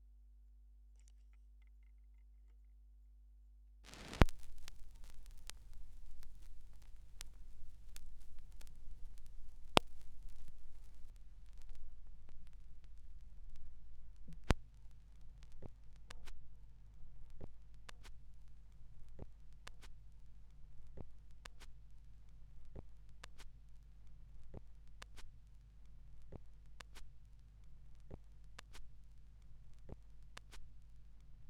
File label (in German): schallplattengeraeusche